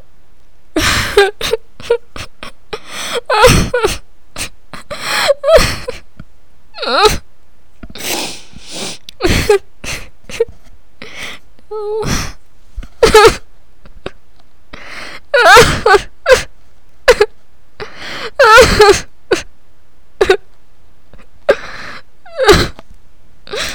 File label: crying